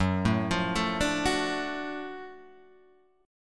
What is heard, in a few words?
F sharp Minor Major 7th Sharp 5th F sharp; F#mM7#5 Chord {2 0 3 2 3 2} arpeggiated